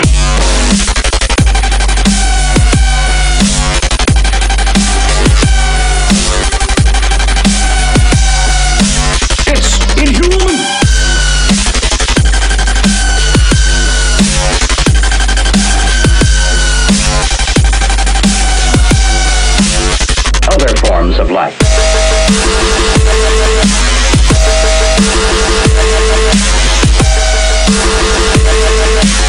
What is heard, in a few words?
drop; TF2Maps; chillskies; wubwubwub